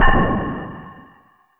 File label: DEPTHCHARGE, FILM PERC; Drums In Your FACE!